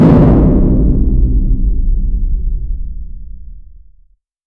loud dramatic defeat sound, sadness

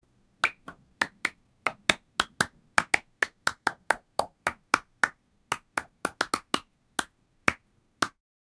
plapping sound

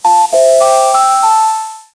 cash register completion; retail

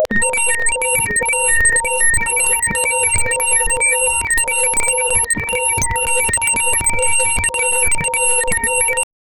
subtle rhythmic computer, bloops

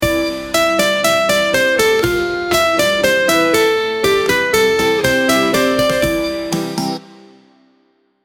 wedding song instrumental

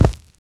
heavy military footsteps

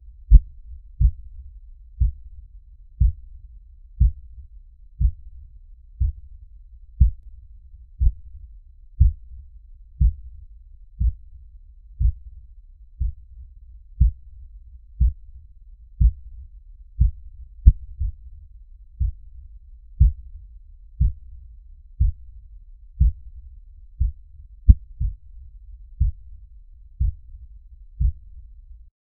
castle ambience slow hear; sparkle

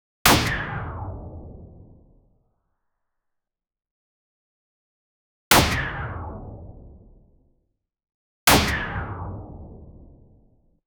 future sounds of weapon s, bullet

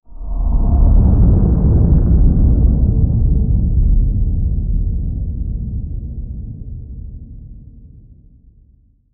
sea, darkness

